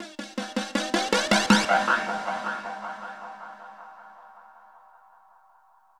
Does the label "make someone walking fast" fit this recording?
No